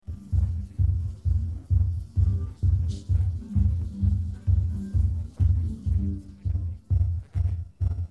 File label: no vocals